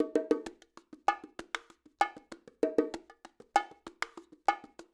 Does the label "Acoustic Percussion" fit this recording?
Yes